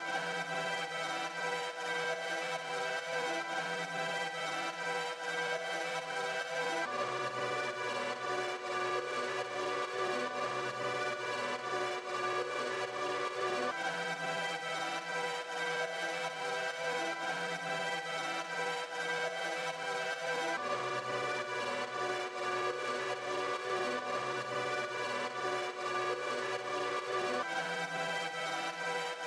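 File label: 140 BPM B Minor Resampled, Trap; Melodies; Arcadia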